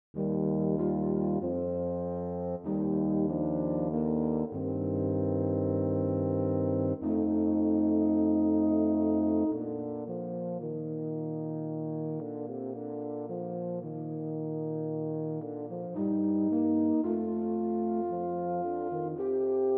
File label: tuba euph